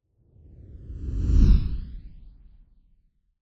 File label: Whoosh Passing Rocket By Whoosh; Hollywood Edge; sound designer's toolkit v2